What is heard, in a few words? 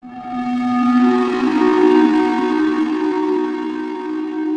weird space sound